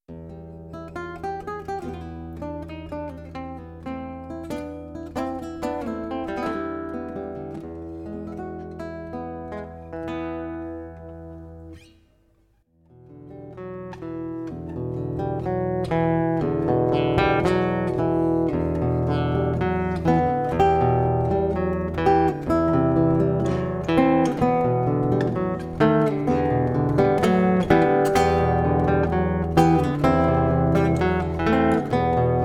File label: aire colombiano